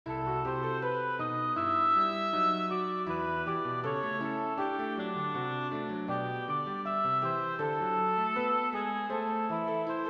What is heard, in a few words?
oboe; Oboe Sonatina; First Movement